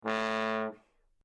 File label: trombone; orchestration